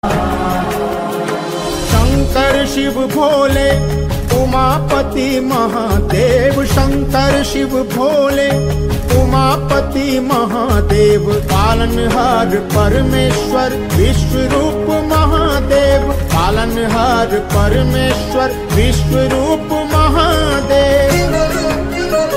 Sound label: Shankar Shiv Bhole Ringtone; ringtones